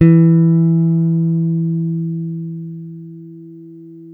E 4 JAZZ GTR; Univers Sons; Jazzistic

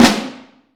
Vintage Snare Drum; Q Up Arts; Johnny.C's Vintage Snare Drum; 4X14 JCBOB

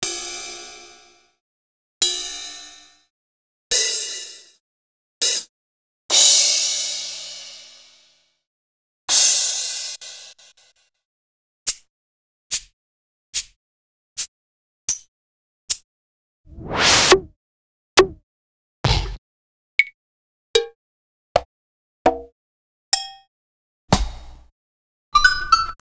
breaks, Jungle; Zero-G Jungle Frenzy 1

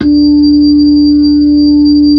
B-3, Hollow

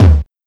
Kick, drums; Sonic Foundry (Sony Creative Software); Drums & Drones; Construction Kits; Squeeze Kit

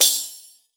tamb, percussion; Best Service ProSamples vol.52 - World Instruments 2; MIXED PERCUSSION

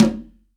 CONGA, STEREO CONGA

